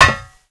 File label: metal; svencoop